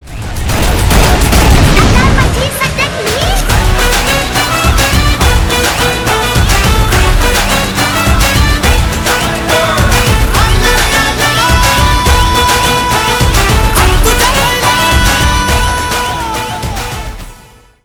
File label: Instrumental Ringtone; Sooryavanshi